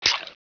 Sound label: hatchet bite; gearbox